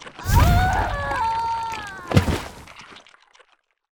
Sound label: templard death; monster